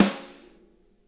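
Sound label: snare center; Sonic Reality; Interactive Drum Kits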